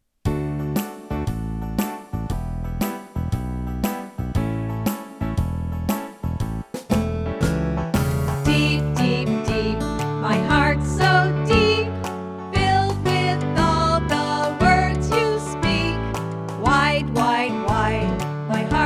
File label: DEEP VOCAL